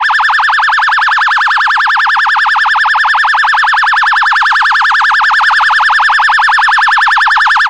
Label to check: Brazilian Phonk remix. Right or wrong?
Wrong